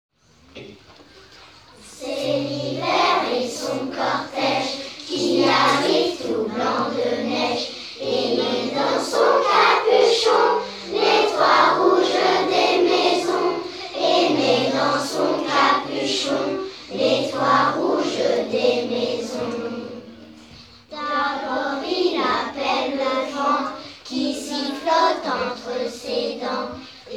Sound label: vocal